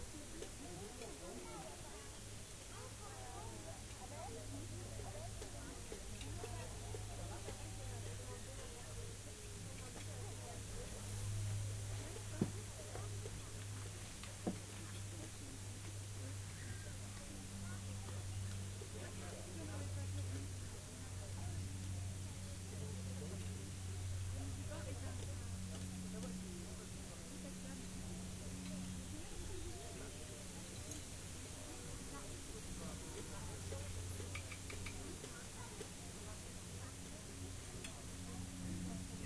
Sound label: rayol farm; SEAMED